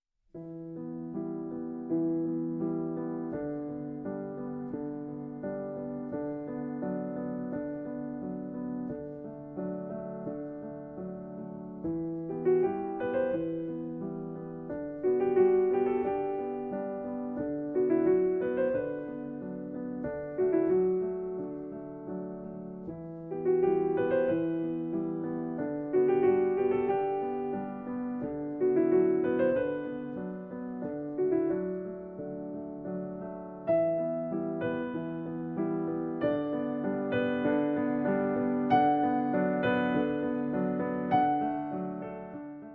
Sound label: Pieces For Piano; From Amélie